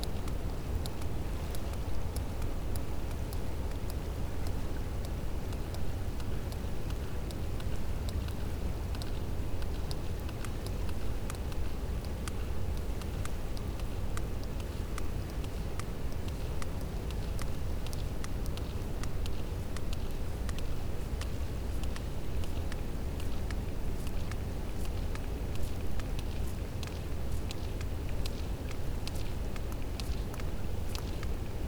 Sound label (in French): cachalot positif, Physeter Macrocephalus